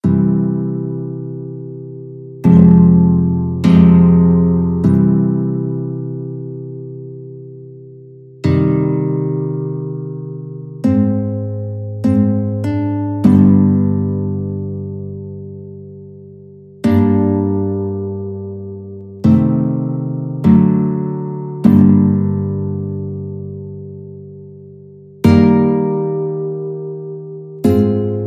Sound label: march, guitar; purcell